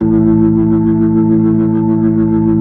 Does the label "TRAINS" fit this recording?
No